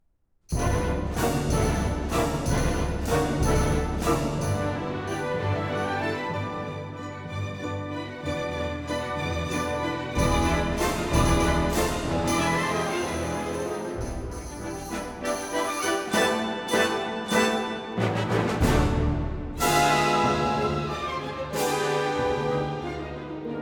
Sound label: Valses nobles et sentimentales, Version for Orchestra; I. No. 1, Modéré, très franc; John Wilson; Ravel Orchestral Works